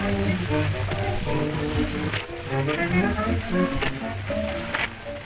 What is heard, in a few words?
Hiss; Removing Hiss